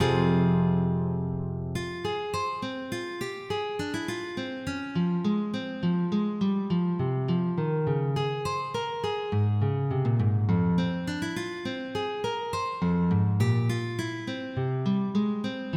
Toccata

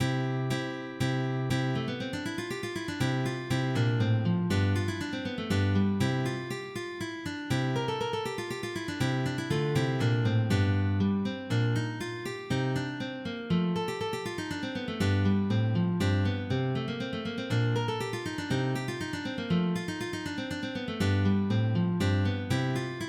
Gagliarda, MIDI; El Burato

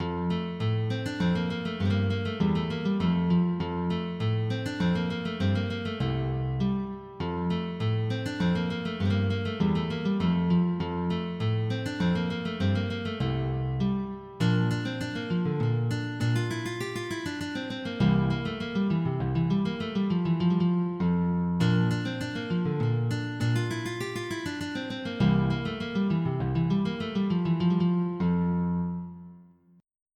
Polonaise, MIDI

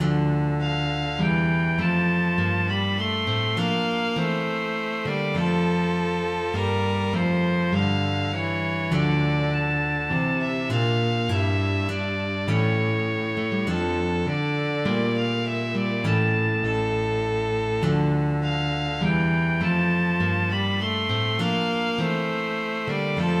Sarabanda

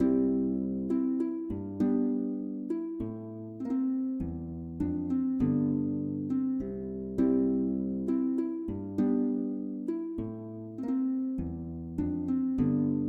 Chaconne, MIDI

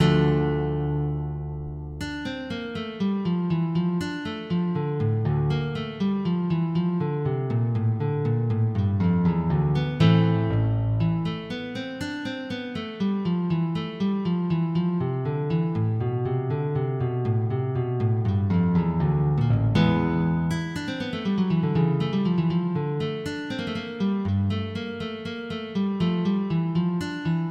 Preludio primo, MIDI

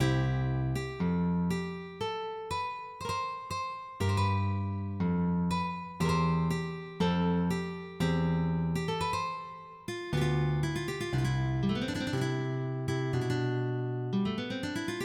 Sarabande, MIDI

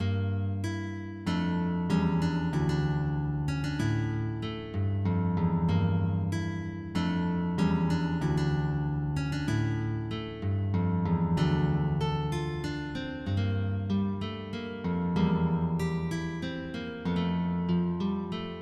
Sarabande, MIDI

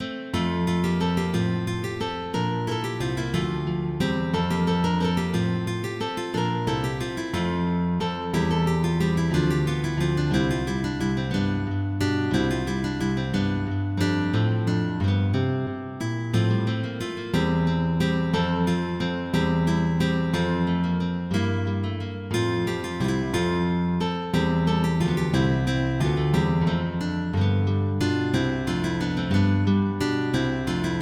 Gigue

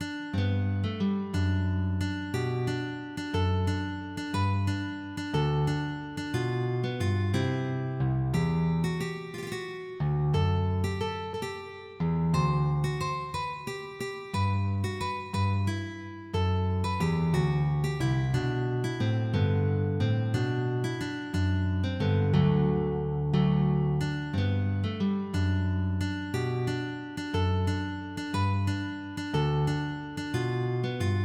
Loure - Lute

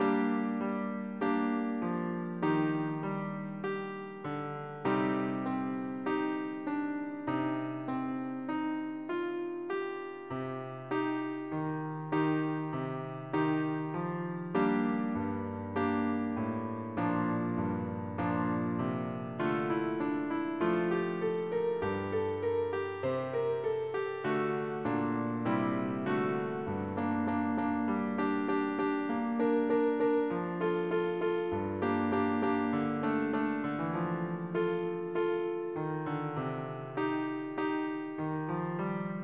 Foccato, MIDI

